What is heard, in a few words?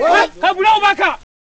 African, African rhythm; Crypton - DrumBase5000; AFRICNRHYTHM